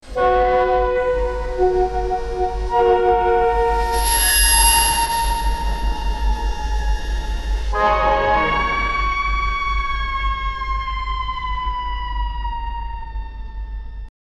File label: epic intro for horror gam; video game